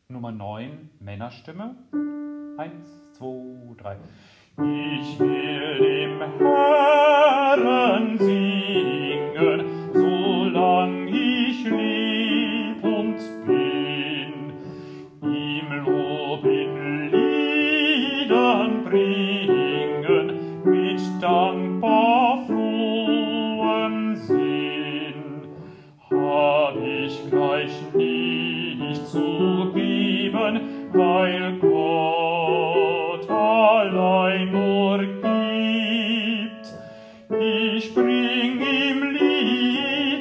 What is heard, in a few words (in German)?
Männerstimme; Dioezesan Caecilien Verband; DCV Chorfahrt; Übaufnahmen